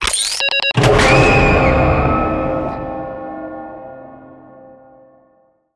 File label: quest status tick complete halloween